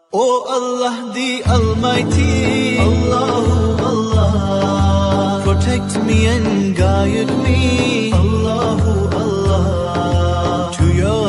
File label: Allah Hu Allah English Islamic Ringtone; ringtones